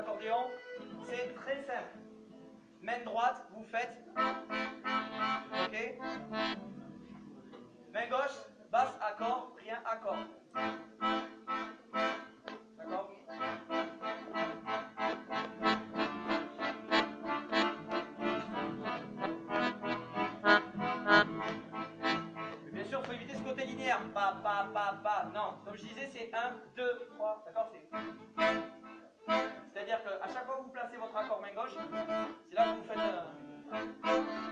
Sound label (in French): accompagnement diatos